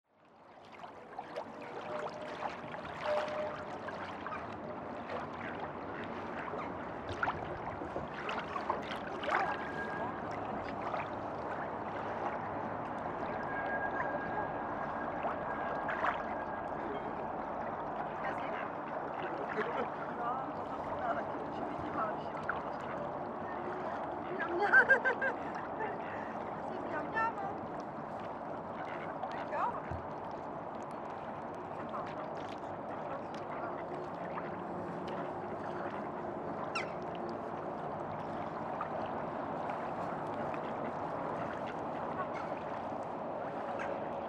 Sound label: feeding ducks